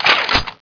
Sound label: gatling bolt pull, weapons